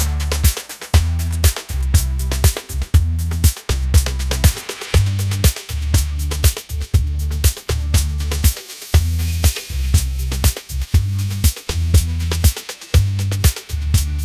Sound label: game party pop anthem